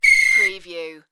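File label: Referee Whistle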